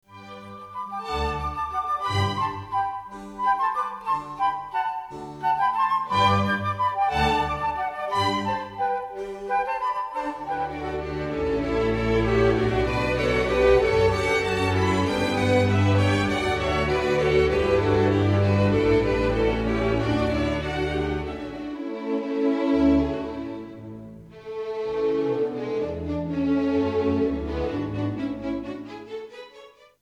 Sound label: Medley Orchestral Moyen